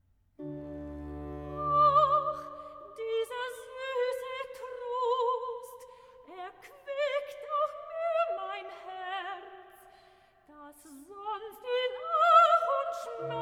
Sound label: recitative